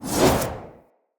Long Sword Whoosh; Weapons; Mother

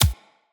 Bullet Impact Hit Notification Two; Weapons

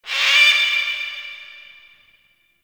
Bird Ruckus Vocal; gameplay; Warframe; Entrati Labs

